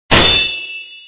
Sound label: Deflect; Sekiro